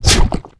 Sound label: spit, weapons